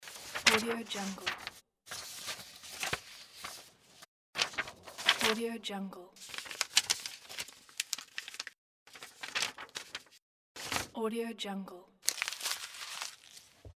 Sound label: paper; audiomechanica2